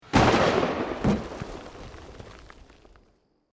EXPLOSION DETONATION SMALL, created explosion effects military style detonation; Bomb Sound Effects